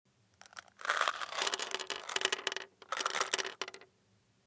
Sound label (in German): Lutschbonbon